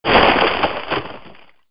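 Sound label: rock scrumble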